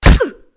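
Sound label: bullet hit flesh female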